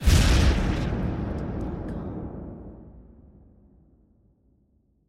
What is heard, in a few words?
anime explosion; SoundsCrate